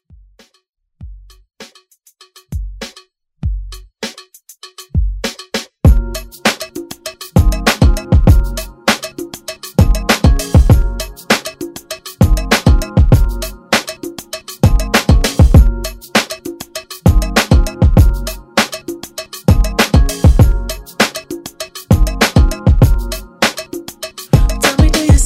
Pop Redrum

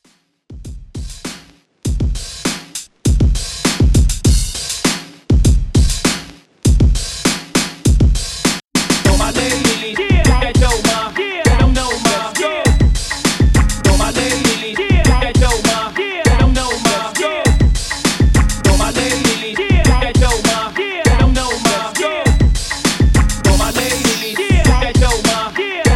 Hype Redrum 100Bpm; djyan

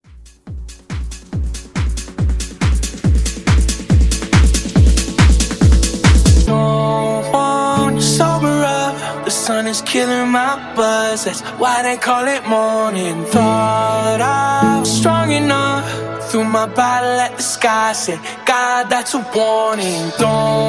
Dance Redrum; Remixfreakz

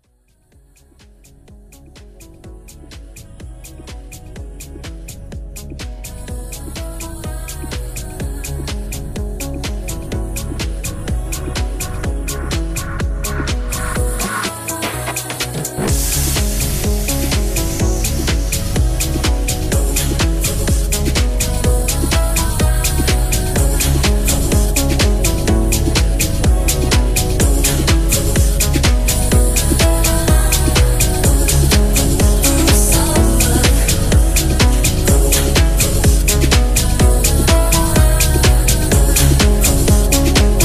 90s Future House 125 BPM

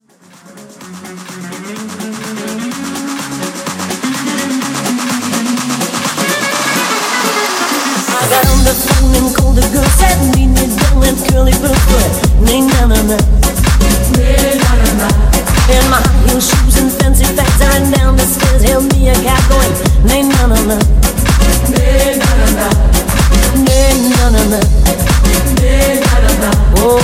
Funky House Intro; Remixfreakz

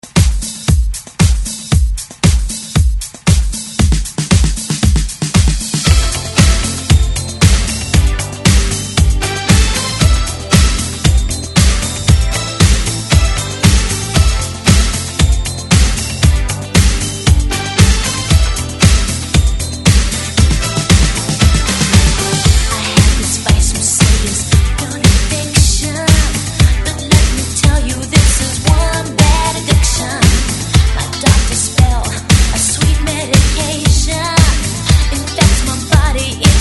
80s ReDrum 116 Bpm; DJDOLLS

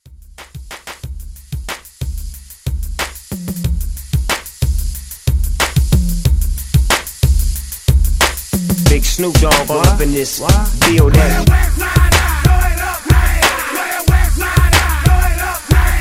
Hype Re Drum

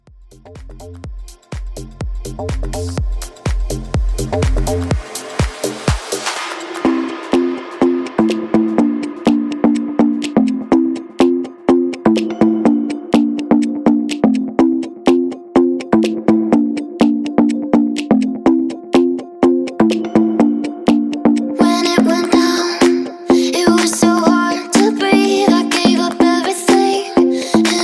House Club Mix 124 Bpm

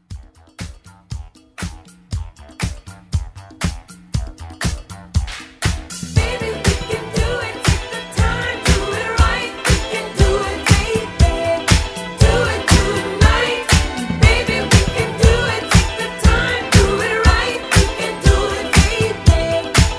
80s Re drum 119

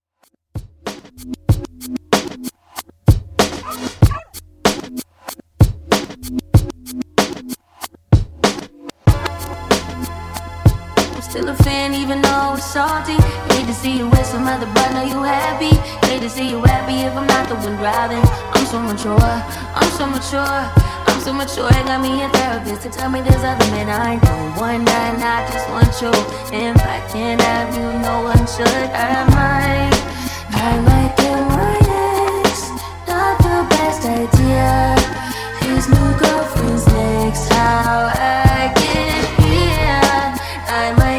Top40 Mashup

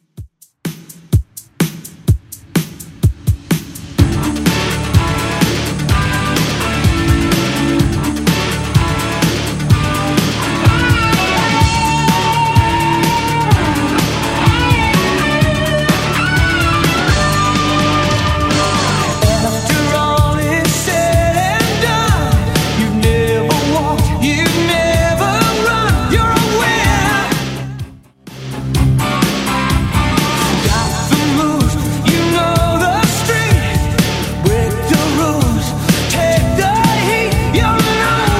80s Rock Redrum 126bpm

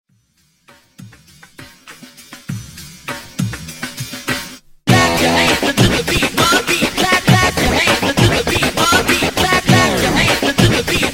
Funk Hype Scratch Transition 100 126

